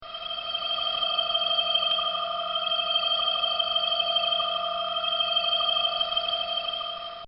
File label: Lazer Beam; Lasers (Sound Effects:Multimedia); This sound effect was recorded in a custom built sound booth